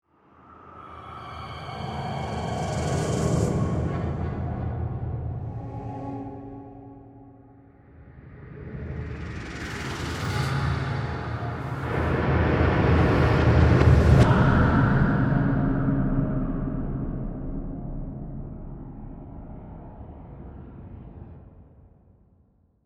Composition Ghostly, Horror, Composition, Ghostly, Haunting; Scary Soundscapes